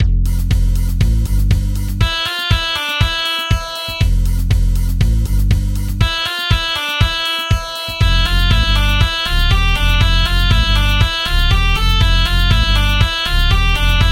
Techno

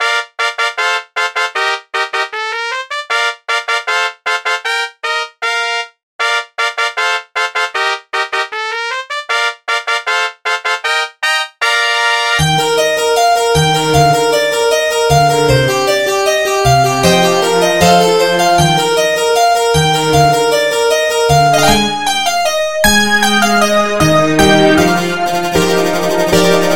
Fanfare

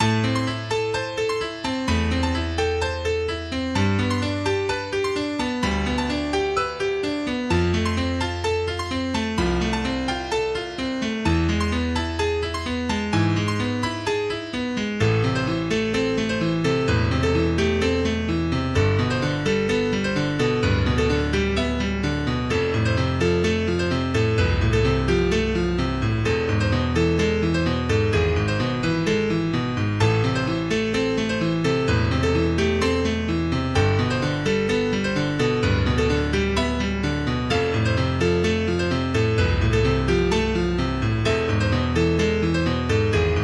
Piano

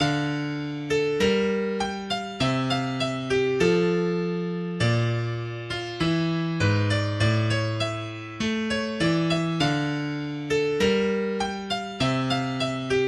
Piano Version

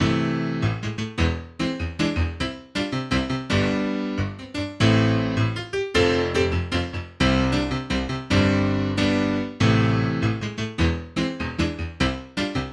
piano

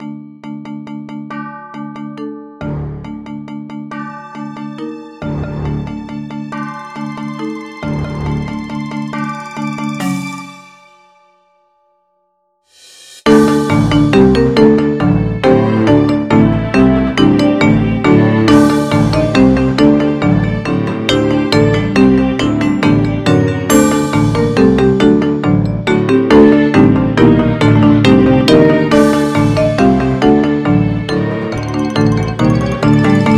Perc Ensemble; Pokemon HGSS Indigo Plateau

